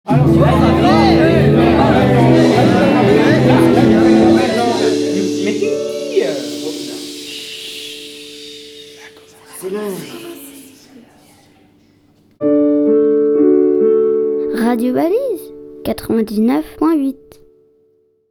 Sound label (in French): Brouhaha; RADIO BALISES